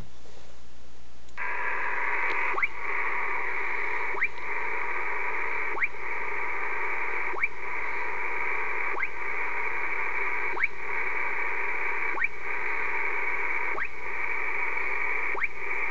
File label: Haarp chirp